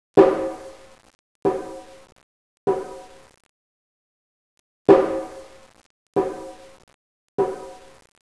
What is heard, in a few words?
taiko